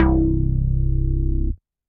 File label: Public Announcement Bass; Drive and Glow; Ableton; Factory Packs